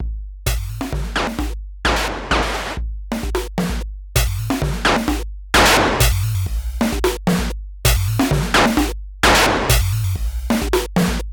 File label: Drum Drive; Erica Synths Sample